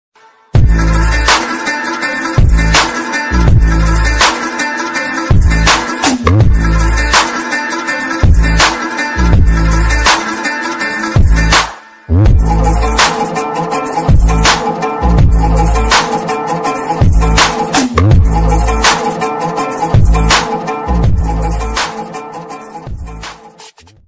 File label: attitude ringtone, emotional ringtone